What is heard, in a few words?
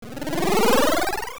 Dungeon Teleport; Teleporting in a dungeon; also a time-warp location appearing in OOA, Veran transforming; Zelda Oracle